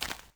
Orko Step; Grounch

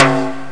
low timbale; gmplay; drums

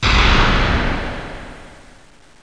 bash; Total Chaos Nukem 3d Pack; duke

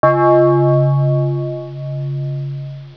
gong

